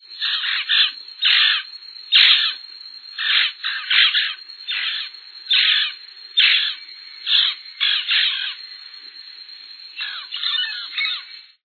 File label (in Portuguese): papagaio-moleiro